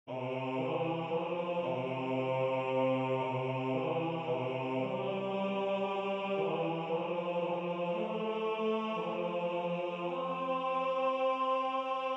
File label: Odalssang Bass